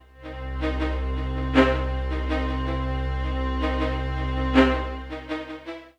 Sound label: string, Drum+Bass, 160, C; eJay